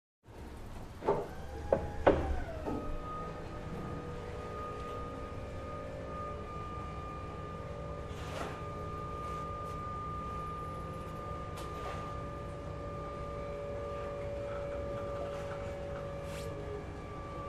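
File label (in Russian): паром пандус закрывают отплытие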